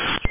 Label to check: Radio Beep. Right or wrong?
Right